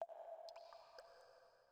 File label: water drip; Rust